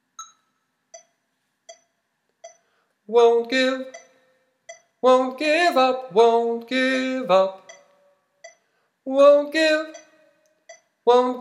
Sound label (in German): Endteil Tenor